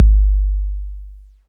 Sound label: DRUM KIT; E-MU Formula 4000 Series Vol. 2